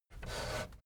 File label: fader plastic scrape single up halfway slow